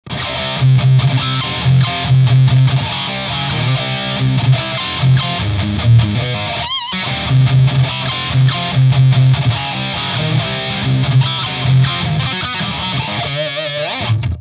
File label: SM7 Void Sharp, Ibanez Guitar Effects Pedals